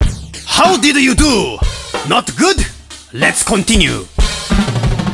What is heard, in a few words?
Exiting Cool Mode